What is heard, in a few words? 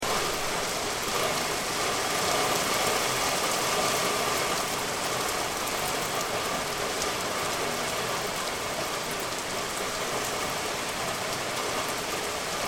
Monsoon Rain Downtown With Thunder; Weather